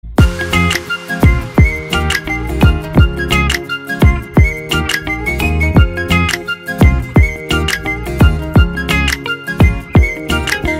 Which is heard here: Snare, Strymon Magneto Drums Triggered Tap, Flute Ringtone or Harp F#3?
Flute Ringtone